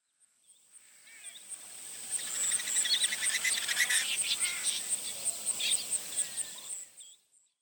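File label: Pin-tailed Snipe, ishigaki, display flight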